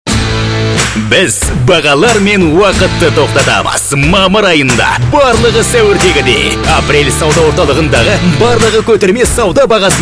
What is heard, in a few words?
Rock